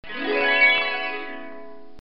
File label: harp run; English Prep Package; TheFishWhoWished